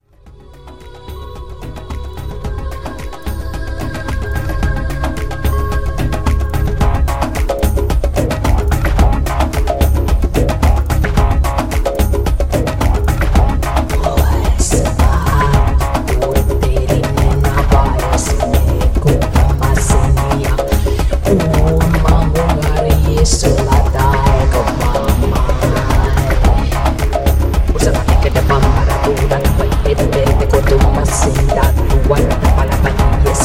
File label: trance of fire